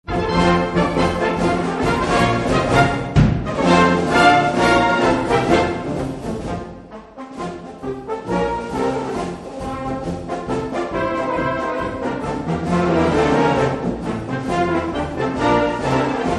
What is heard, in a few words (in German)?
festmarsch